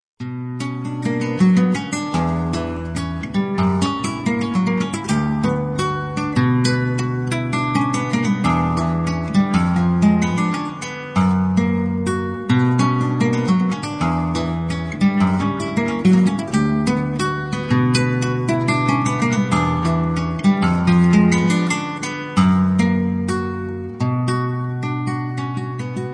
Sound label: guitar solo